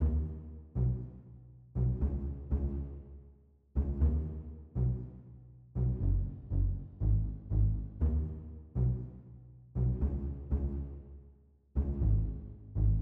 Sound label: Christmas Melodies 120bpm pizz 120 E